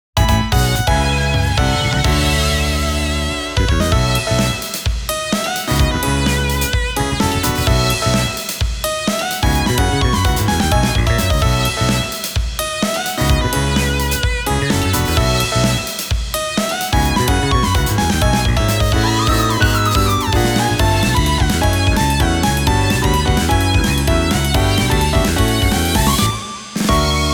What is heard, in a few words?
Fusion